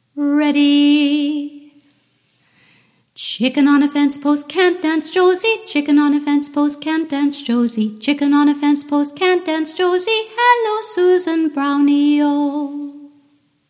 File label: Chicken On a Fence post